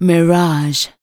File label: vox, Techno Trance; Ueberschall